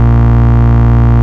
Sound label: Root A#, Ultimate Bass; Vengeance Samples